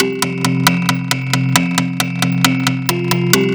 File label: Electro Chord; Techno (Техно)